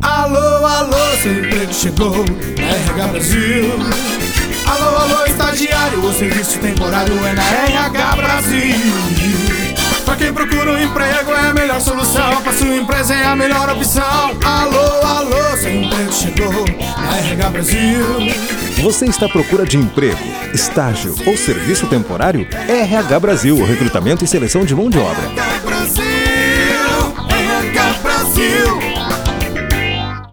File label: Jingle; RHBrasil